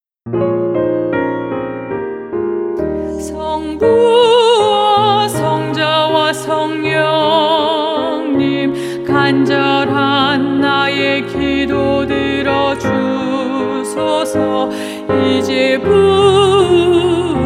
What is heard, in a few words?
ALTO